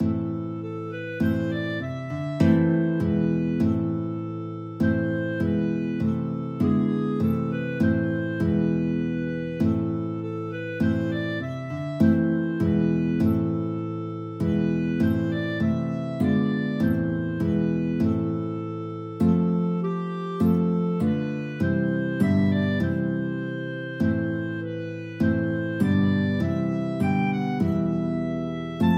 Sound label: guitar clarinet duet; aberystwyth